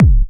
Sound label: Lo Slung, Kick Drums; Zero-G; Soundclash